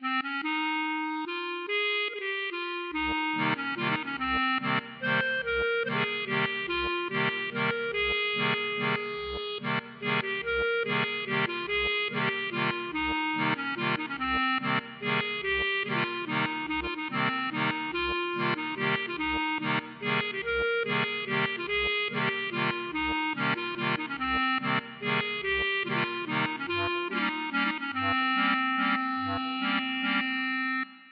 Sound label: klezmer